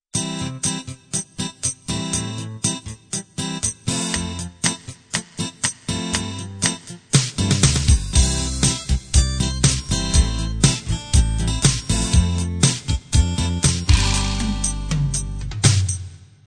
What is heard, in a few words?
bubble gum intro